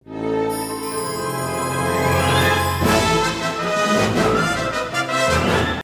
Combat; Level 2 Sub Level 8 Qte Combat; The Adventures of Tintin: The Secret of the Unicorn

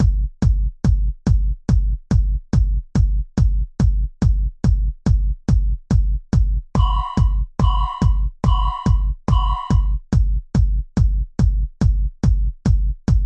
Beat Hi; gamerip; GAUGE

